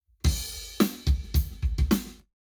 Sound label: Lab Wet Drums 90deg; eMagLS